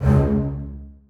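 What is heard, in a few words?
basses staccato c2; Sonatina Symphonic Orchestra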